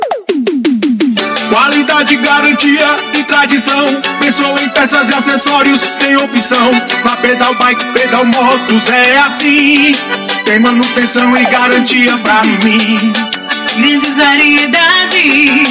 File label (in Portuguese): Passinho; Pedal Bike Motos; jingles comerciais